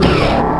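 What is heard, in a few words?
door start; lmctf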